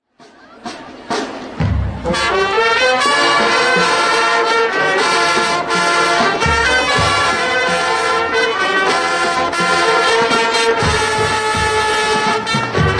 superbass

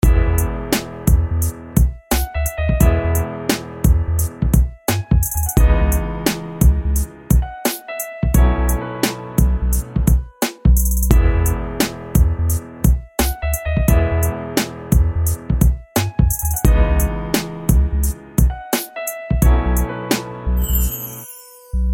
Piano balade

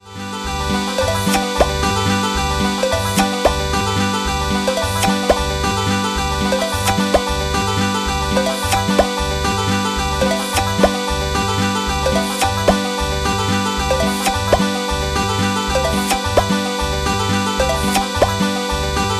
WAKE UP SOUND HTC

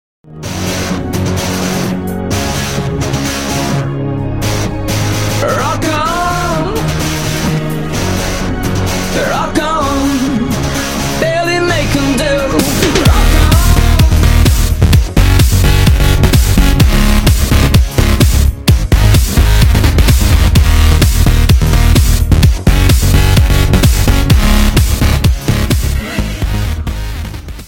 Is Calling Rock

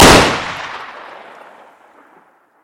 M4A1 carbine gunshot; ringtones

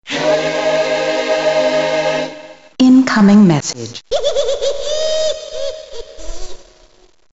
incomming message

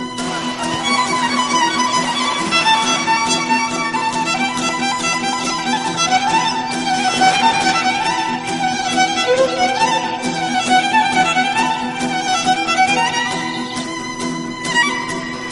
romanian violin; country

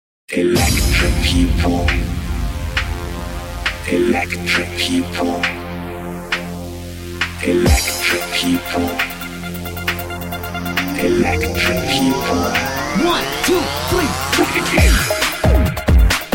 electro song, ringtones

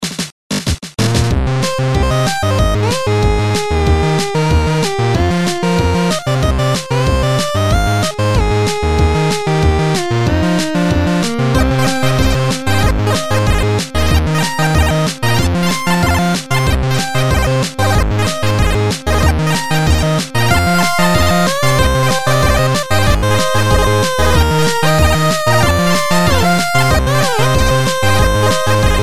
keygen music; electronic

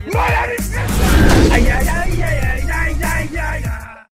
Ajajajajaj

